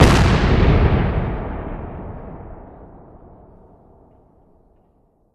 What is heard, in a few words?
Explosion Gasbag; War Ambience; Prydwen